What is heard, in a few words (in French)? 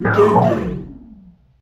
Cri Super; HOME